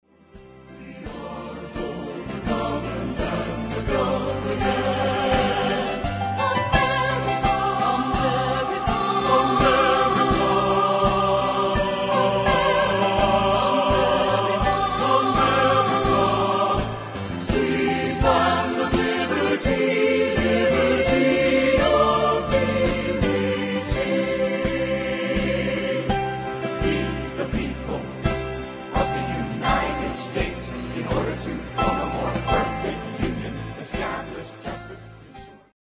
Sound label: satb